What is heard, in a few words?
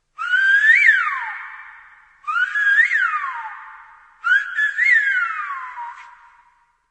Silbo